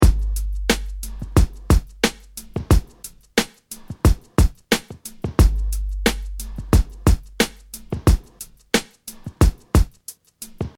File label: drum loop